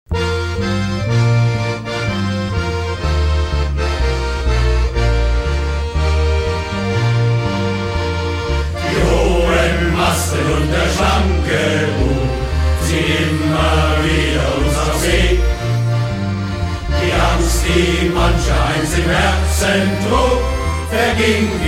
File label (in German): Lied; Gorch Fock